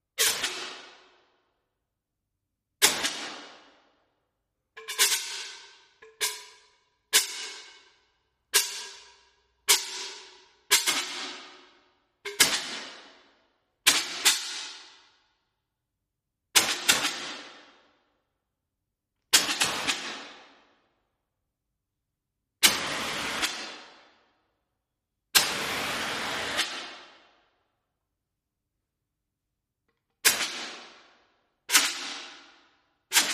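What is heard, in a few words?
Fire Extinguisher Burst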